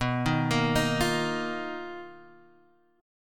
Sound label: B Major Sharp 11th, B, down, Guitar Open G